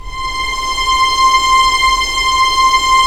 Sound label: STR, Roland LA Composer